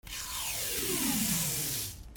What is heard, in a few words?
RIPPING